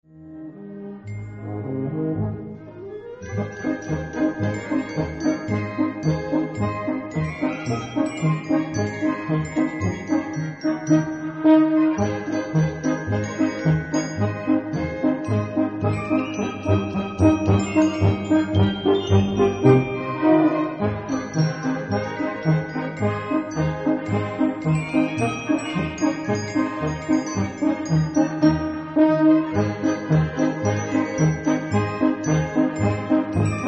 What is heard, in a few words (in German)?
Florentinermarsch Bariton